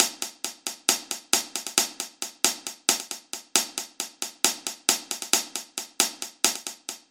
BB30 hihat

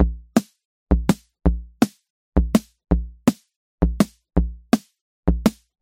simple beat

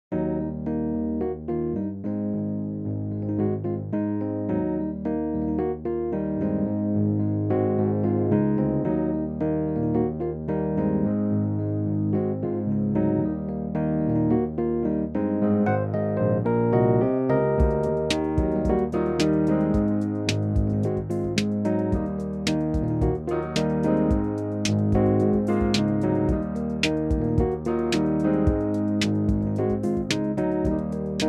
pianos

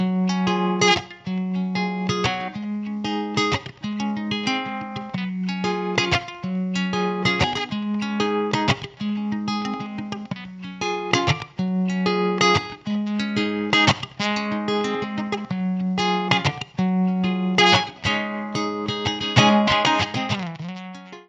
uke

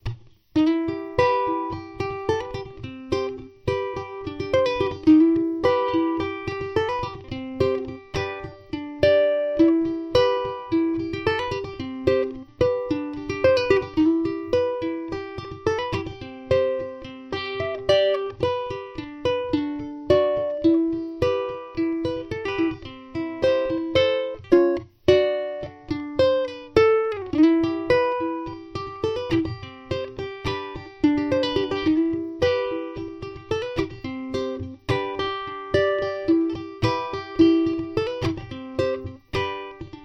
Tenor E minor fingerpick

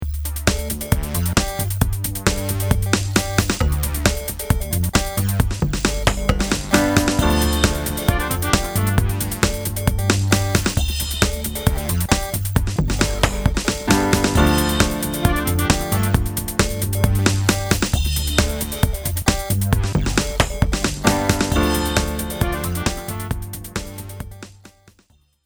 Electro Groove

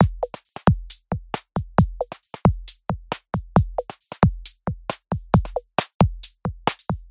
seek beats 135bpm

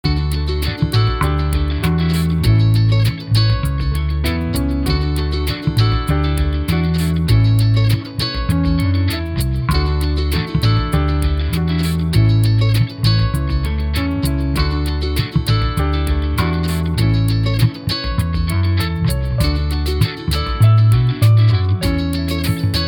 acoustijam

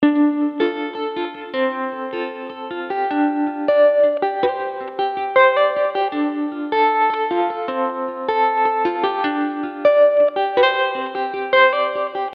Fingerpick

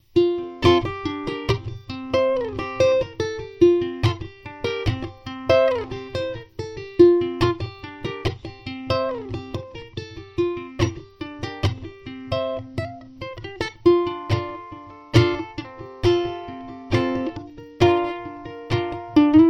Tenor F hybrid

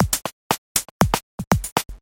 loud beat

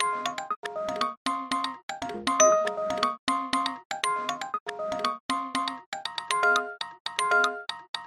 musicbox shuffled

